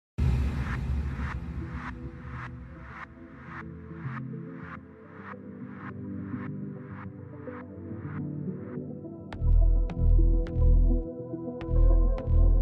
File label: tropical deep house remix